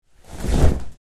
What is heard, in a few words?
Dracula cloak movement vampire whoosh